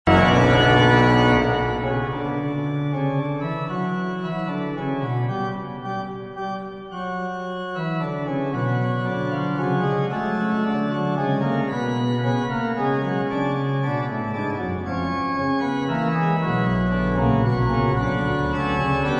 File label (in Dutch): fantasiesonate in b fuga